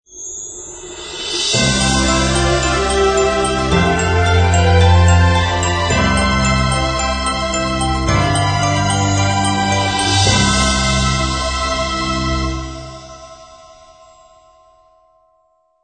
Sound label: Christmas Opening Ident Short Version